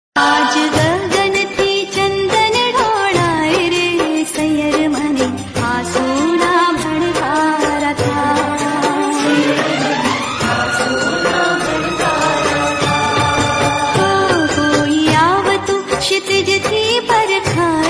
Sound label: gujarati garba dj ringtone